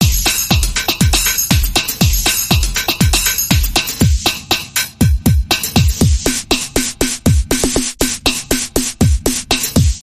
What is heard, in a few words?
Bopper Club Dance Bumper Reversed